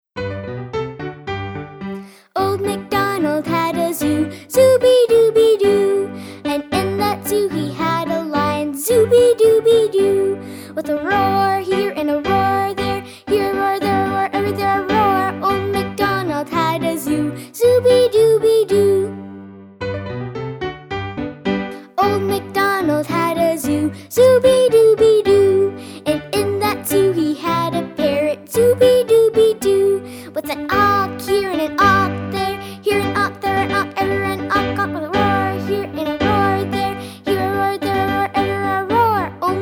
vocal

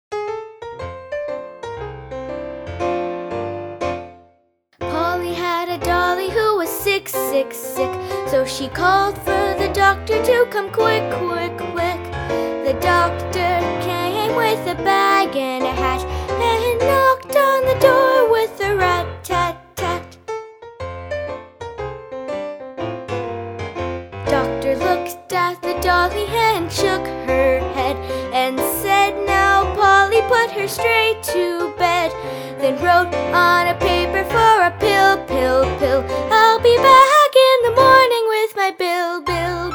Polly Had a Dolly vocal

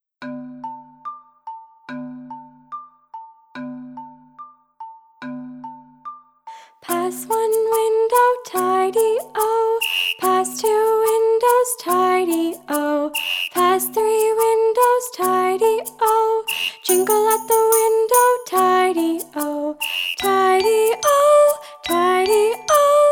Pass One Window vocal

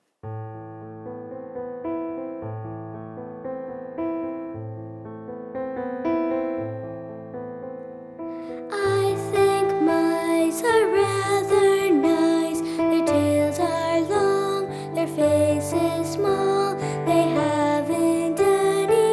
vocal

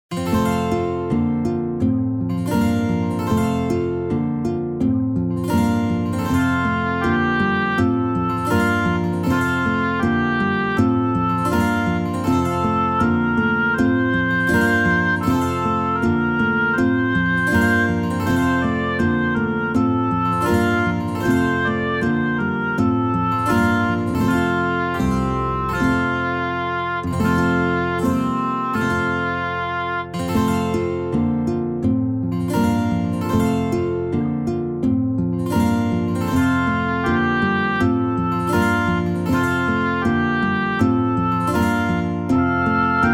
instrumental; Frere Jacques

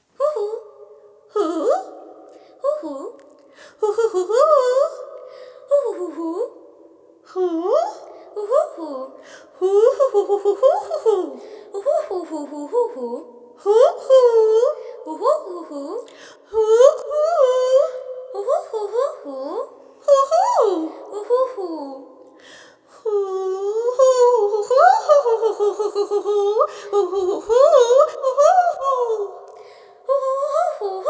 Hooting

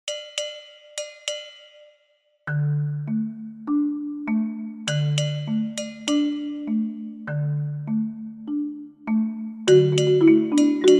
Little Liza Great Big partner instr

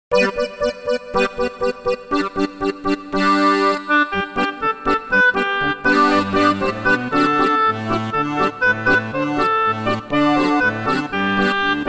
Dreidel dreidel

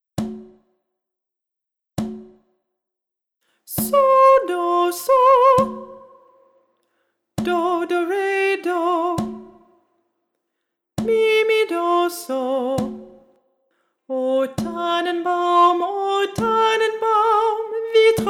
O Tannenbaum echo

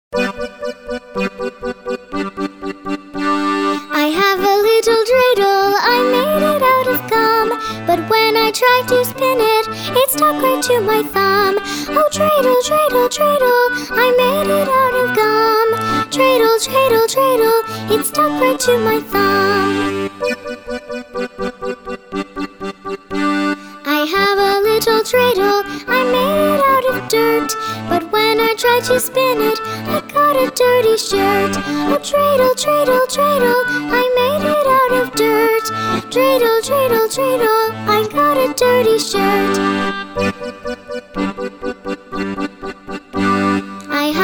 Dreidel Dreidel parody vocal

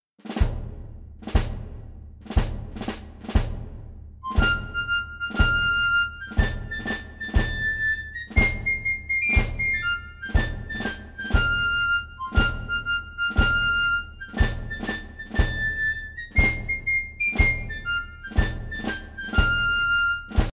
Military

